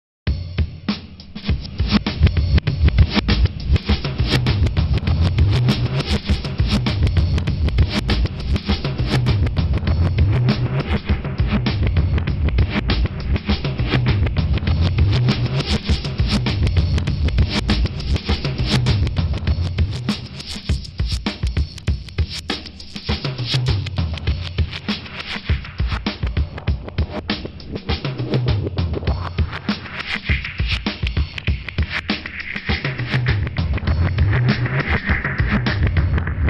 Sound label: filter